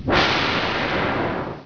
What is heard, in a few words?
fire rocket; Ruckus